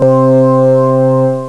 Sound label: pipe organ; Echo 1.1